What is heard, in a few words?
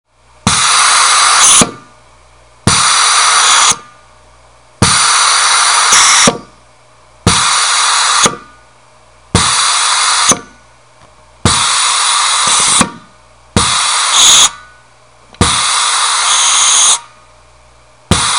air escape